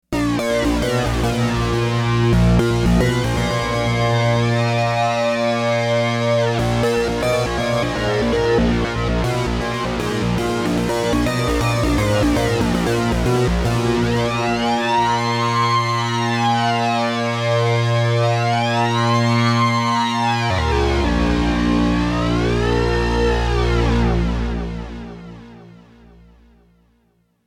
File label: OBXA CROSS MOD LEAD